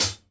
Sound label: SHORT, kits; Sampleheads; New York City Drumworks VOL-1